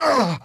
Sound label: pain